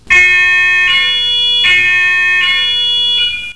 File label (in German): Martinshorn